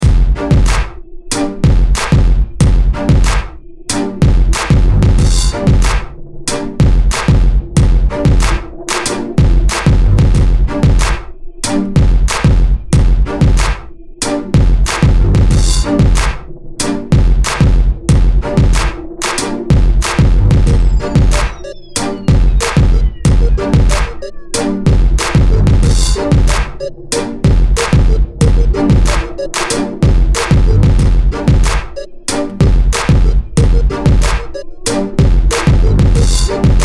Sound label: groove